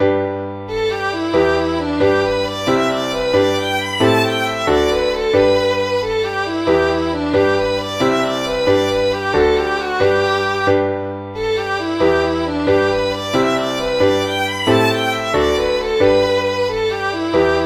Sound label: irish jig; willie coleman s